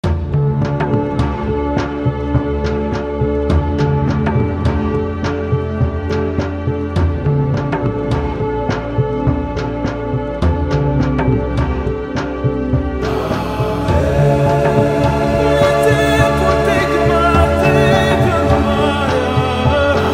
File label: Introit Chant